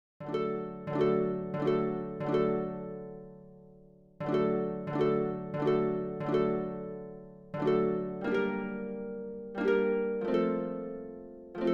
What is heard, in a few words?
recercada ACCOMP AUDIO; ortiz recercada 4